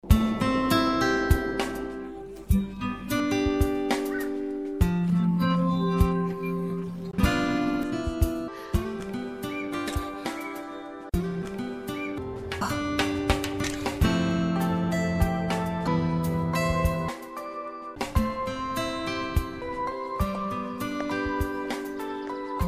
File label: Guitar; RnR